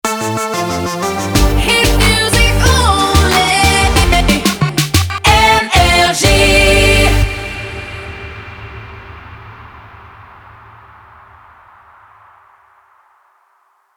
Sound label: Jingle Shotgun; NRJ